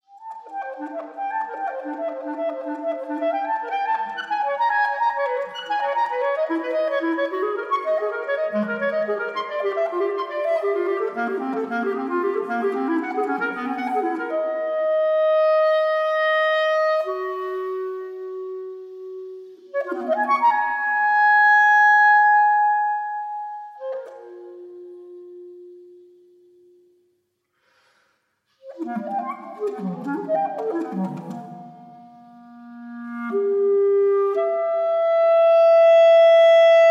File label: movement for solo clarinet